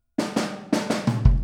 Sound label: Boogaloo Kick, 82 BPM; Sonic Foundry (Sony Creative Software); Drums from the Big Room The Mixes